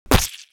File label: Cartoon splat; splat sound effects